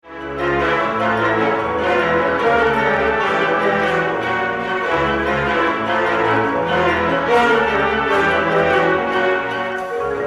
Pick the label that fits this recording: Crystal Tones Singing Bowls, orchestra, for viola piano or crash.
orchestra